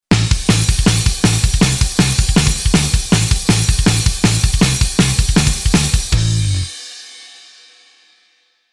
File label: Bass Metal